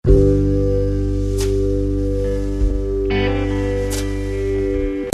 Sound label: ORG GTR